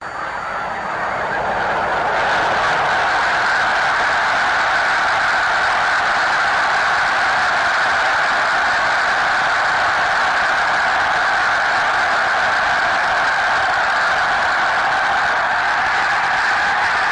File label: flock of snow geese birds; Zoo and Nature